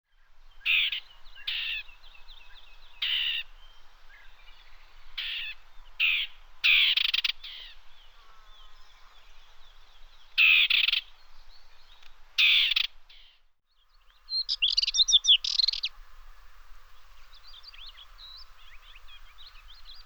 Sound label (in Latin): Sylvia undata